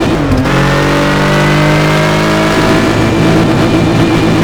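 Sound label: third, car engine; sgmcars; 15gt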